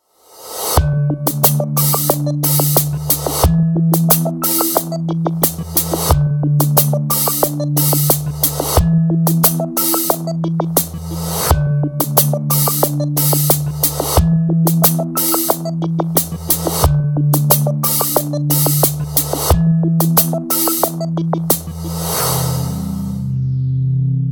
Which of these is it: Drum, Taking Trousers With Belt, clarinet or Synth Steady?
Synth Steady